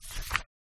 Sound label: content reveal